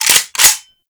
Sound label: AK-47 Chamber, Kalashnikov Reload; Warframe; Weapons